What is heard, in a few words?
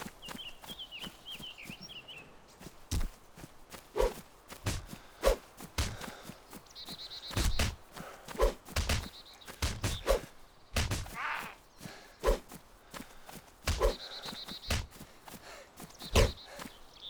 action fight; dayz standalone